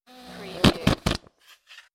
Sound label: Whoopee Farting Blowing Raspberry Sound